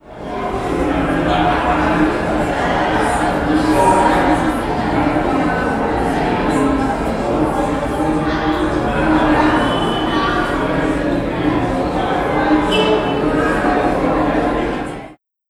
Walla; Google Earth VR; Cities

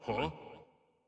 Aggro; Dinkubus

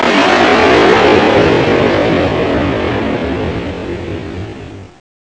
Car Nitro

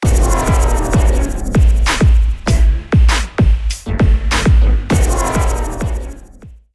Lose; fast furious 6; Android, iOS

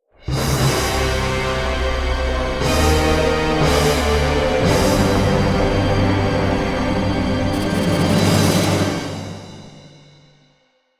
Lose; Ironclad Tactics (2013)

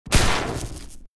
Redlightning Zap

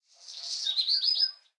Ambience Menu Little Bird; subway surfers city sound effects

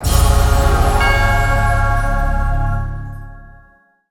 quest completed

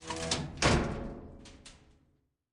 Metal Door Shut; Lethal Company

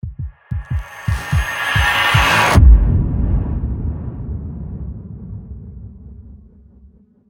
Stinger Poison Death; Inescapable No Rules No Rescue

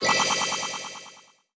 Reward Tokens Sparkles; Subway Surfers City Sound Effects; Android

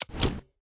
Switch Endless Scene; subway surfers city sound effects

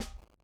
se lesson (DAN BGM DRUM)

